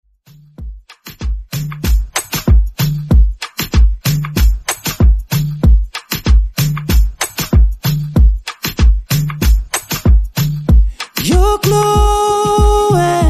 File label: 95 BPM